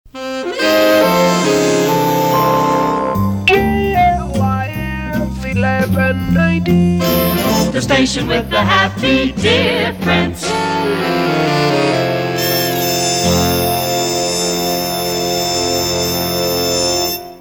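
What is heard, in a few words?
Reeds Sig and Theme Sonovox; KLIF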